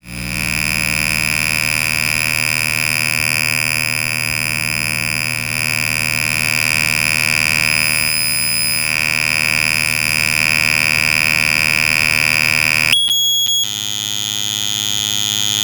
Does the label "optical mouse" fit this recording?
Yes